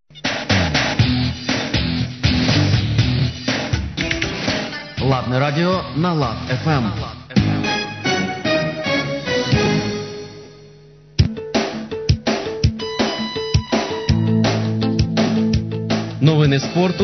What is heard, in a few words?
interval signal; ur ternopil lad